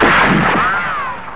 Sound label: KAPOW; WEAPONS